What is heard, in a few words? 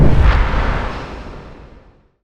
blink out arc; dota; queenofpain